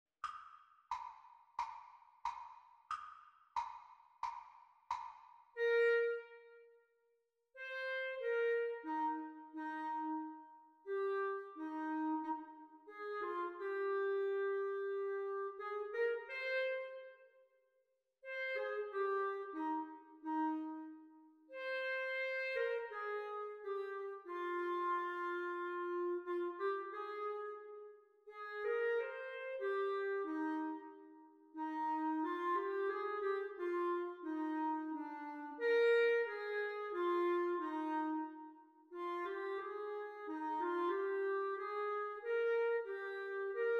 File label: practice recording clarinet duets; Grade 3